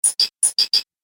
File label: Arcade Game High Metal Hits; Video Game Sound Effects; Retro Inspired Sound Effects - Think Nintendo, Atari, C64, Etc.; Andrew Fleming